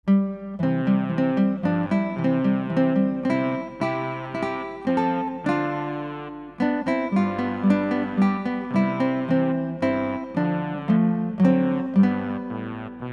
guitar trios for beginners; traditional

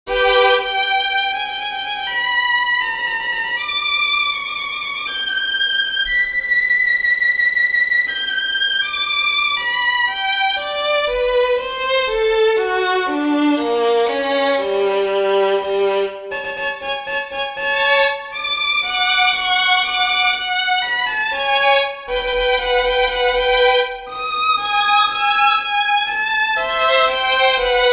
violin concerto allegro cadenza; guillermo guillemo; mozart 3rd violin concerto

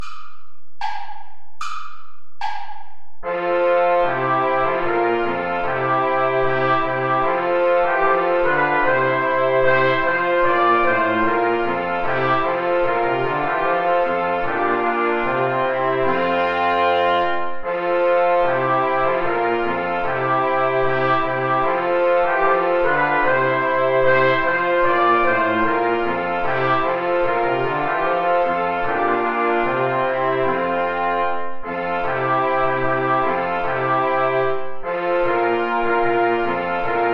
play along without trombone

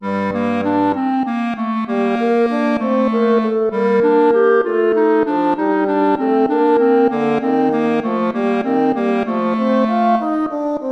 gigue

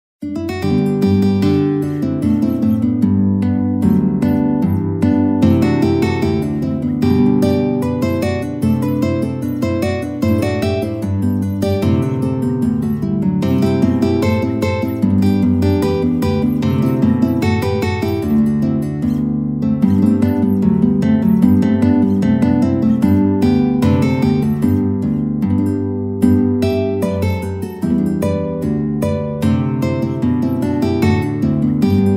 concerto guitar quartet

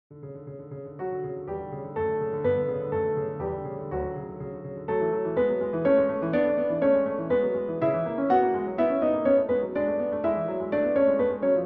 andante grazioso for piano; mendelssohn bartholdy felix; from lieder ohne worte

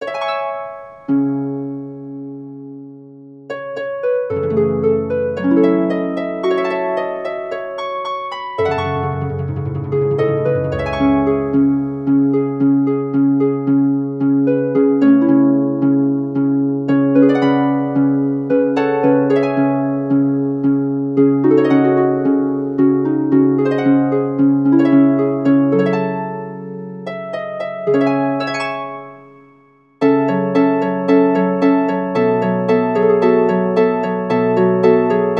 for harp; Rimsky-Korsakov, Nikolai; on the hills of georgia

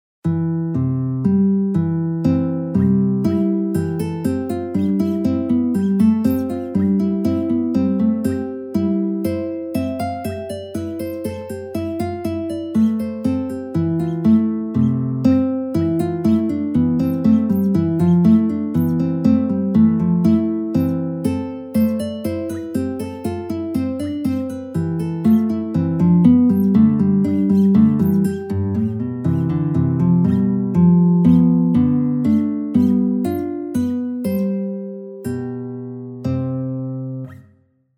invention major

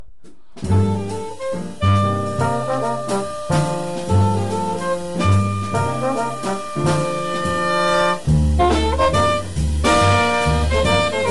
blues

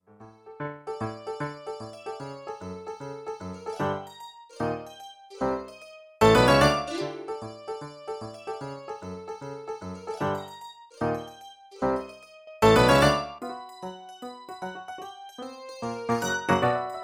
tarantella for mandolin and piano

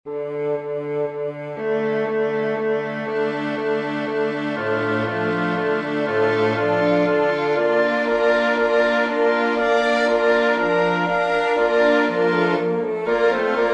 for winds, strings; Conquassabit capita; Dixit Dominus

